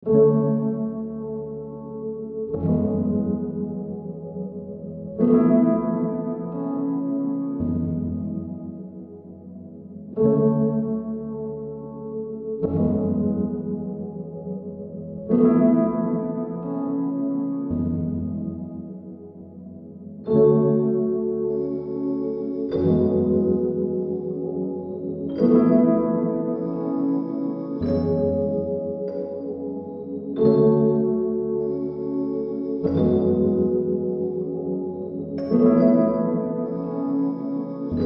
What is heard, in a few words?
Melody 95bpm F m; AV Dreams